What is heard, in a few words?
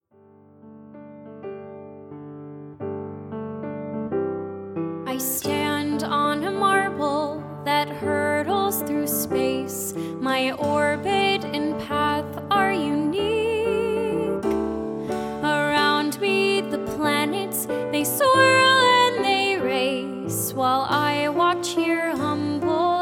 singing all